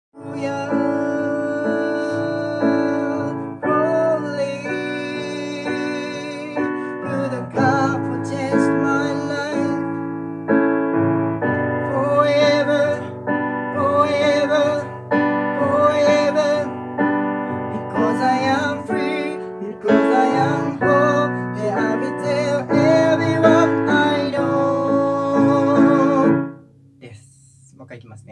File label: Men